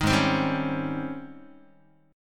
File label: G sharp Major 11th, Guitar; G#M11 Chord